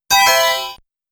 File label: Credit (Coin); psikyo arcade sound digital collection vol. 04